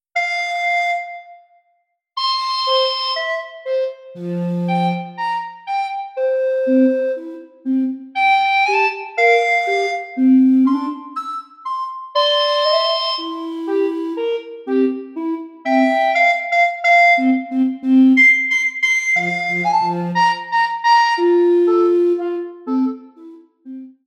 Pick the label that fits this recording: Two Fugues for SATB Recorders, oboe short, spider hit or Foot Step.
Two Fugues for SATB Recorders